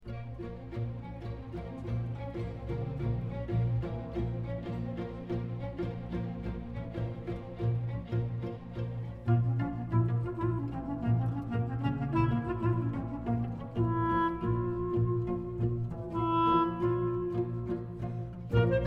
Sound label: Symphonic Klezmer; balkanplatte